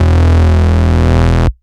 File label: Root E, Bass